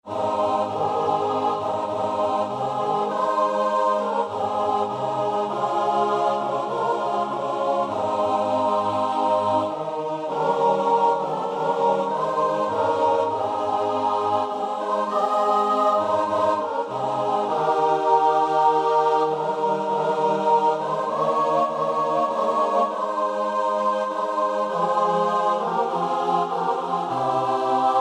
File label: choir